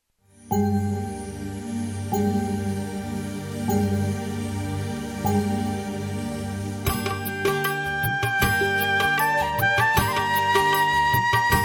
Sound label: Male